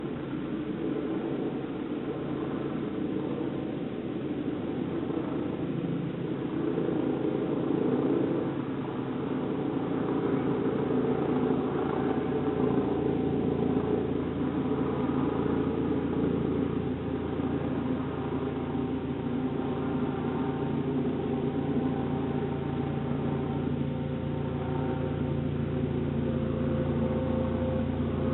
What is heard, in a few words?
HELI